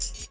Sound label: KIT, Dance Mega Drums, HIP; Best Service